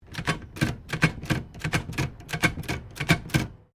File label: Typewriter Remington Standard 10 Scroll, Old typewriters; 1900S; Typing; Offices